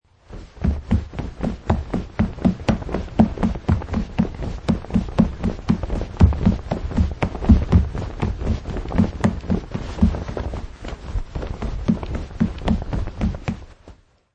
Running, Footstep - Man wearing jeans and hiking shoes jogging on carpet surface; Footsteps (Sound Effects:Human)